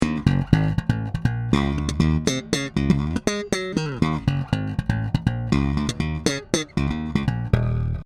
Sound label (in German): both PU aktiv slap